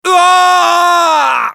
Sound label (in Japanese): マスケッティア男･死亡; オーシュ